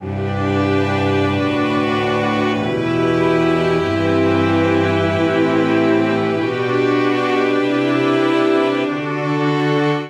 Progression IVmaj9-Vmaj13-vi-IV/ii-iimin9-Imaj7/i-IVmaj9-V C Major 95 bpm, string quartet; MIDI Clips; Wandering; Ableton; Factory Packs; Spitfire Audio